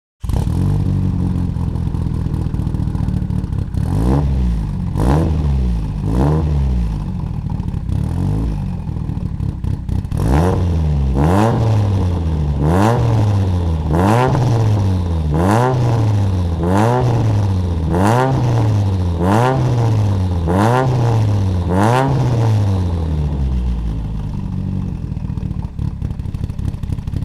Ignition Exhaust Sound; Initial D 5th Stage Sound File; Initial D Music Lossless Collection

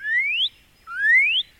birdred; Indev+ 0.3 Portable

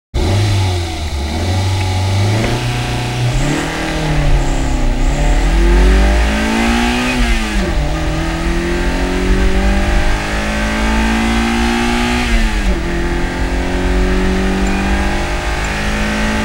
Boost Sound

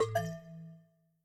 Windows Hardware Insert; Pearl

